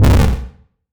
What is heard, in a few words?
explosion quick